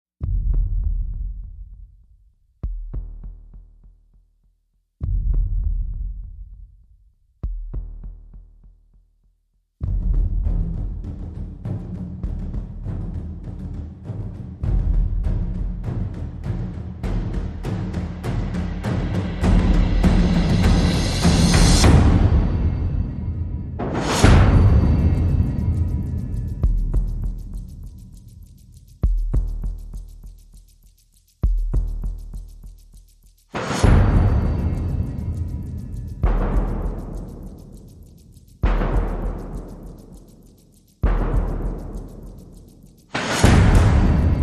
Perc Only

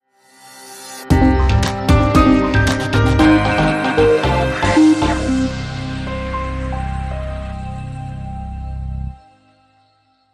Sonic Chill Out

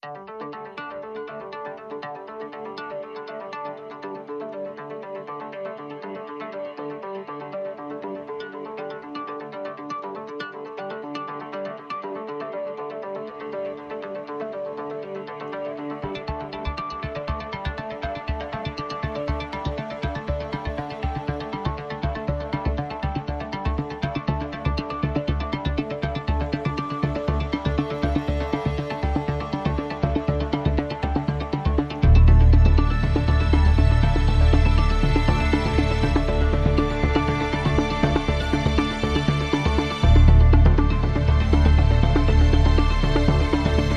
No Percussion

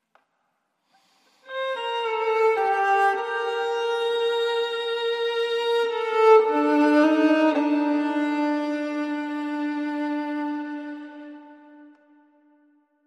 Only Violin At The End

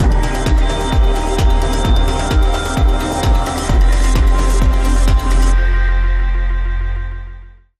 Link Sting

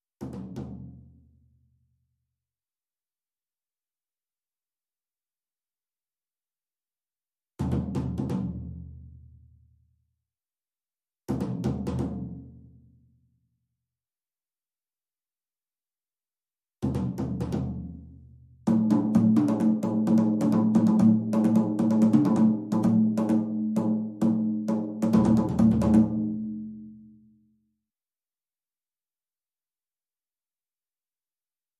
Track Layer Mondo Toms

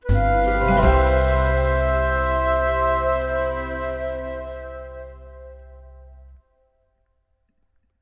Short Sting

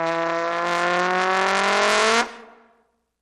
Trombone High Gliss Up

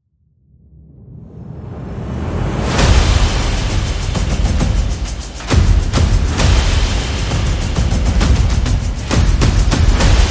Percussion and Effects

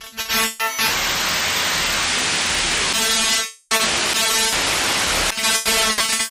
glitch; Cyber Duel

